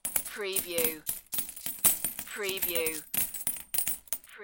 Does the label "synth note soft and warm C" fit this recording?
No